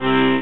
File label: Cartoon Accordion Squeeze; SFX Kit; Foley - Misc